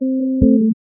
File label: Chore Started Lower Bass